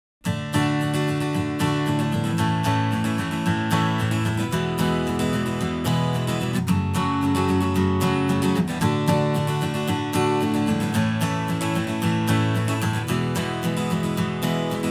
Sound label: D35 strum